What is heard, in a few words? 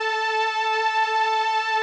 505, String Machines